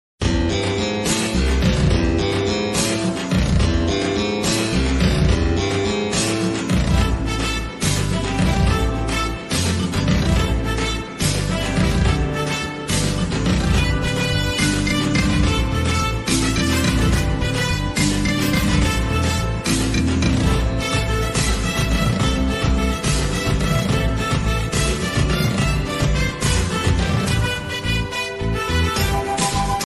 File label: tamil mass bgm ringtones; amaran